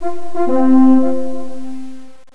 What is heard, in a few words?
sfx Message Notice